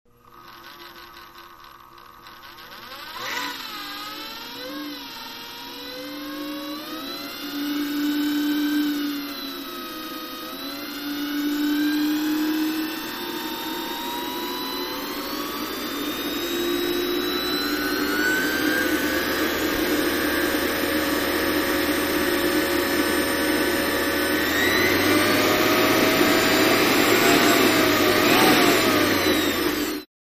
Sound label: Highspeed Blower, power on off